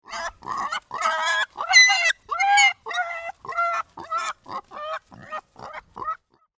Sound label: pig squealing